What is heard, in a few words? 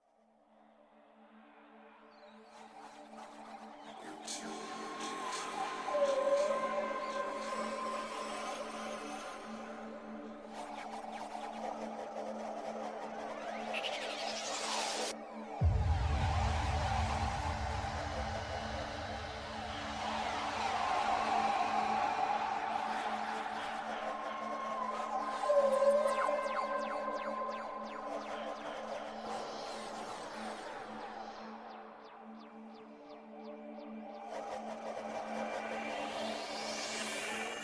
Cryo Ambient; Junk Jack